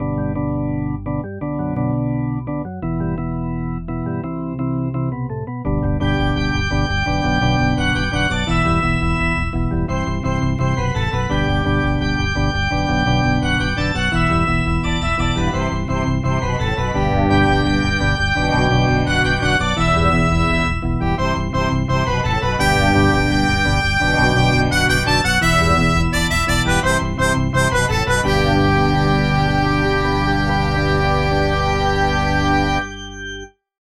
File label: Organ; The Office